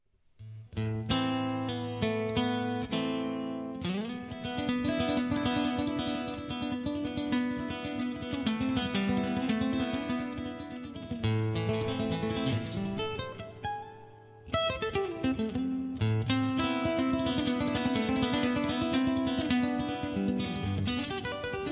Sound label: Classical